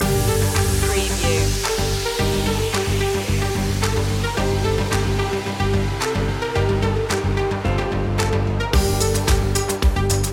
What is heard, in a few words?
corporate machine loop; media music now